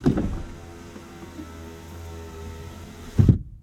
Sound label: window rollup; Blind Drive